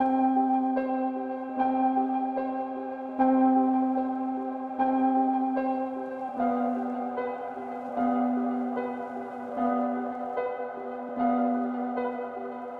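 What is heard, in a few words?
150 keys half underwater C minor; starlight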